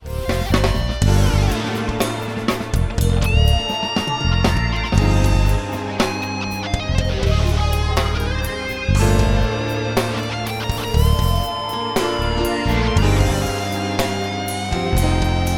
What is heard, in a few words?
Guitar